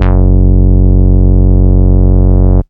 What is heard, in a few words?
Root D#, Bass; Vengeance Samples; Vengeance Ultimate Bass